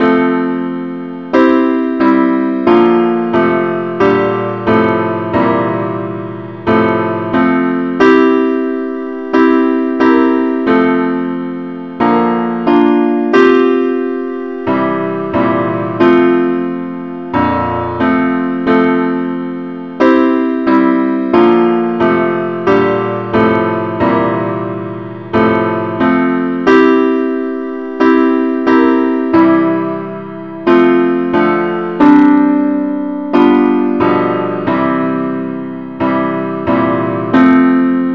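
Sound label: gwalchmai advance Accompaniment key f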